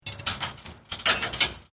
Chain Metal Clanging

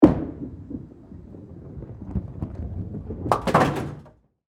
Bowling Roll, Ball, Hit, Pins, Follow; Sports

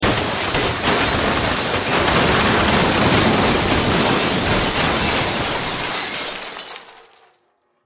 Impact Crash Roll Large Object Heavy Long; Crashes

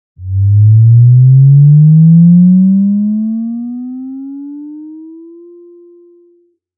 Bass Rise

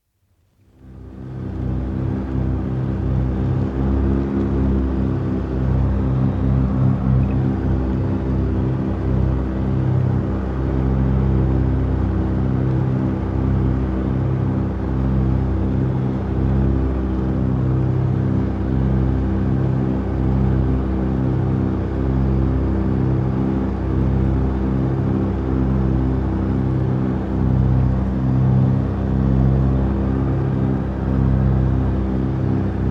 Interior, C47 Dakota World War II; From 78 rpm disc; Airplane; BBC

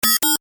Tech beeps, Beeps, High Tech; Stuart Duffield

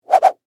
Whip Whoosh Double; Foley; Sound Effects:Objects

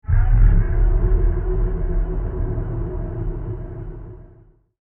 Meteor Impact; Miscellaneous Impact Sound Effects; science fiction sound effect

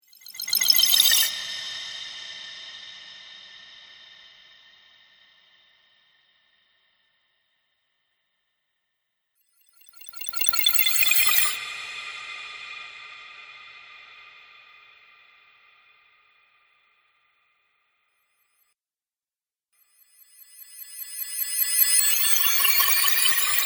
Spell Pack, Magic Sound Effects; 4X Spell Pack - 02; Role Playing Game

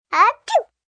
Sneeze Toddler, Sneeze, baby, short; Sneezing

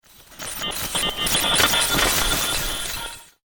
Broken Glass Computer Glitch; electronic sound effect

